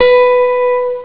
pluck, instruments; sonix; Rise Of The Dragon; chipmusic

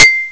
Ding instrument; sonix; chipmusic

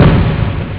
boom instrument; sonix; Who Framed Roger Rabbit; chipmusic

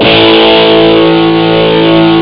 low metal; sonix; Rise Of The Dragon; chipmusic

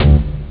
taiko, instruments; sonix; Rise Of The Dragon; chipmusic

